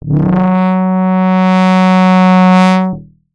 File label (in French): trombone cuivre